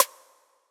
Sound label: snare808